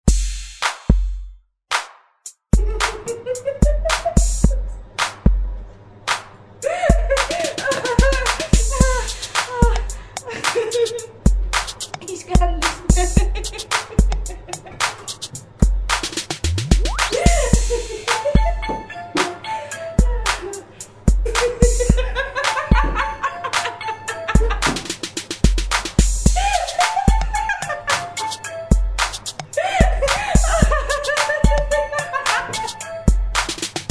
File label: Song